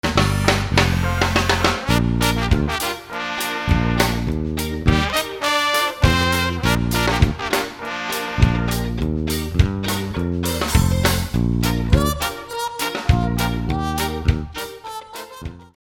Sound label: world beat